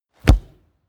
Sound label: football kick